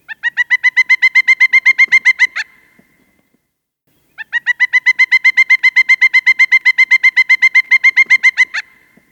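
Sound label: pileated woodpecker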